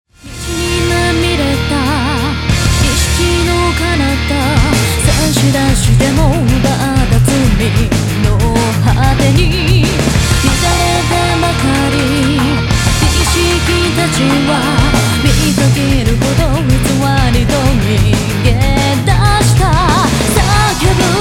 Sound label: ring tone